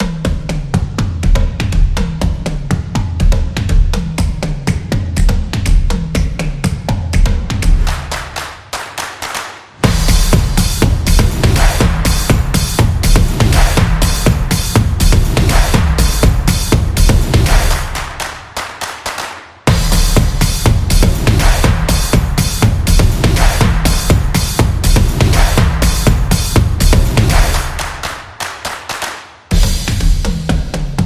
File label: Upbeat Drums Music